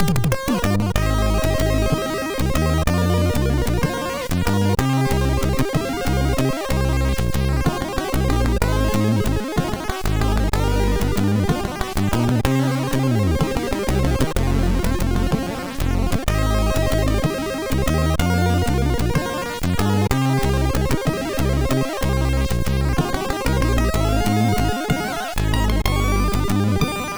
Soft String sid MOS6581R2

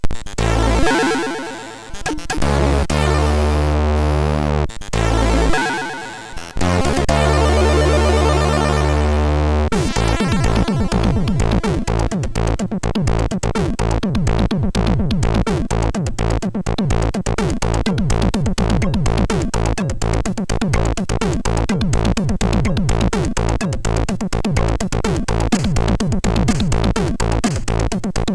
Classic Mix, sid MOS6581R3; hvsc

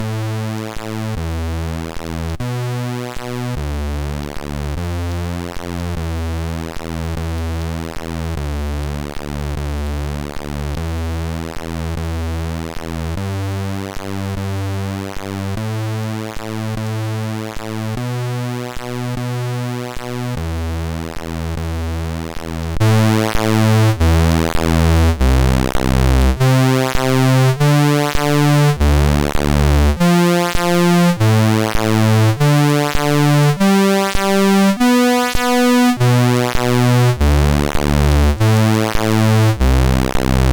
Thunderstorm, MOS6581R3

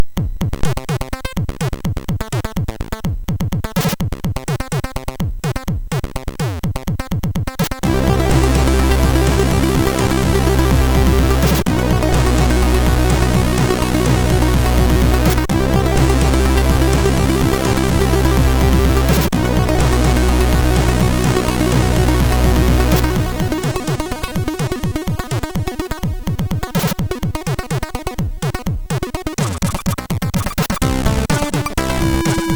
Jazzy, MOS6581R3; sid

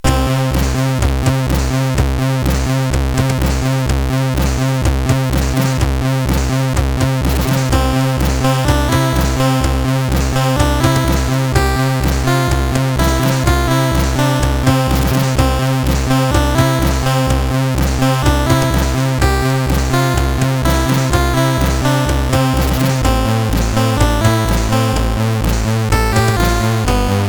Casual Sound, sid CSG8580R5; hvsc; Lord SID

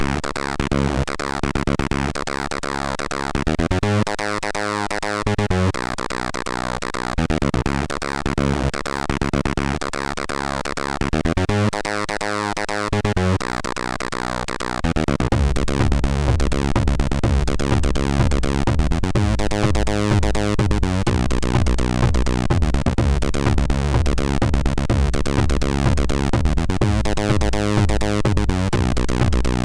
sid MOS6581R4; Thirty

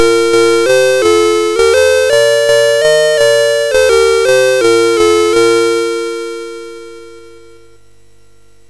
sid MOS6581R3, GAMES; Alice In Wonderland

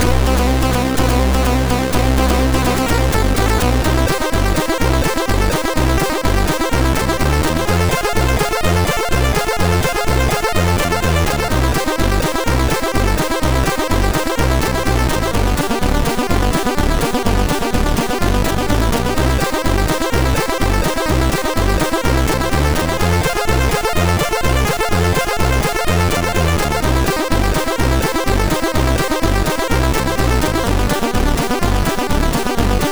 Country Song, MOS6581R3

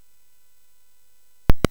sid MOS6581R3; Outrun Europa

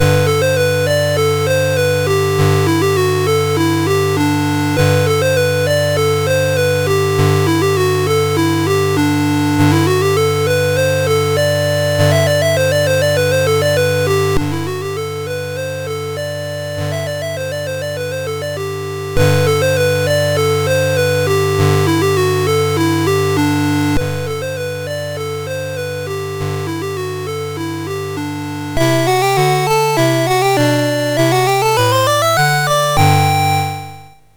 Country Dance, MOS6581R3

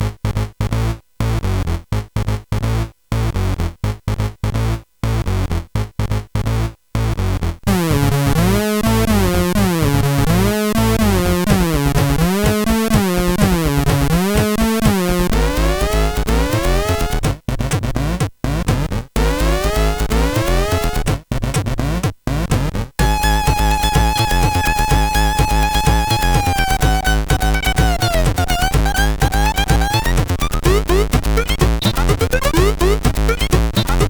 Technobeat sid CSG8580R5

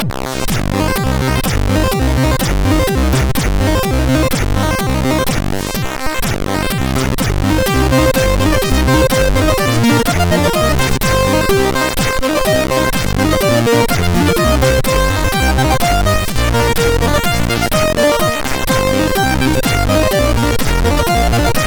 Polka, MOS6581R3

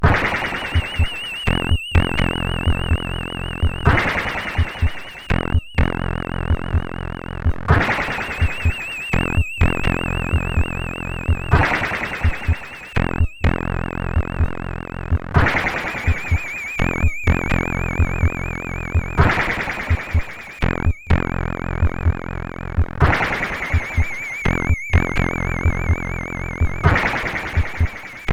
Oriental Music

